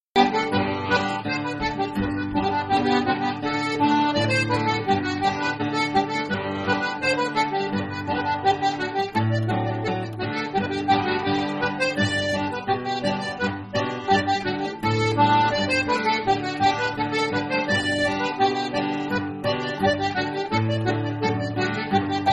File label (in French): Contre danse Anglaise